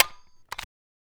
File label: jamming rifle; ACE3; Arma3; overheating